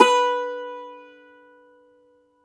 Cumbus SAZ B, Plucked Strings; E-MU Producer Series Vol. 7 – Old World Instruments

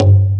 wood drum; Pro-Rec; Synthsonic

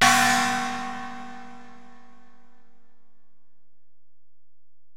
Ride, Alesis DM-PRO

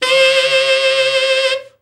TENOR, SAX; Best Service Gigapack 1

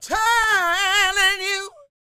SING, Vocal Planet Blues Soul BLUESY

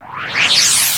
NOISE, Production Effects

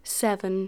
Female vocals and robo-vox

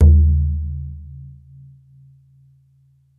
BODHRAN, Celtic Percussion

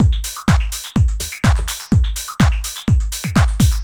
DRM, LOOPS 125, House

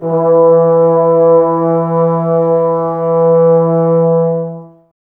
Brass, Woodwind Brass Ensemble; Miroslav Vitous

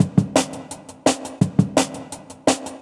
170, Jungle Breaks; Bullet; Original Jungle Breaks

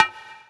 Conga; Equipped Music; Loungin House

